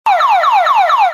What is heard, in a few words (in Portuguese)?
sirene